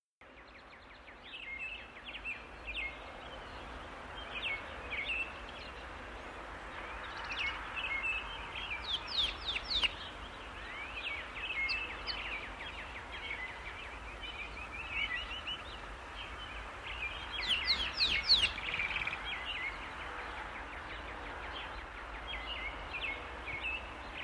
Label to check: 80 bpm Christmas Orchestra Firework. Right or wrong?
Wrong